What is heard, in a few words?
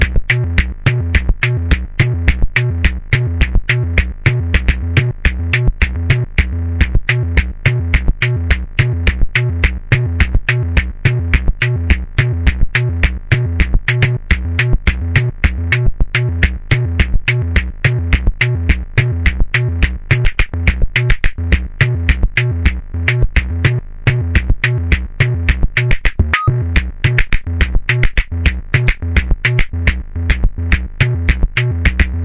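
offbeat techno, 105 bpm; melodic loops; synth and electronic loops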